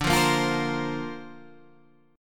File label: D-Minor 7th Sharp 5th, D; 10,8,8,10,11,8; Dm7#5 strummed